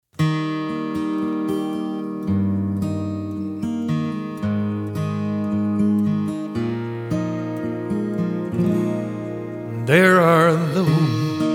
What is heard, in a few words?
(ballad version)